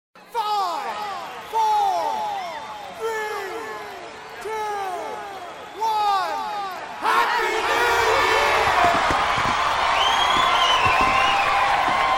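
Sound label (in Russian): большая толпа отсчет перед новым годом; Звуки обратного отсчета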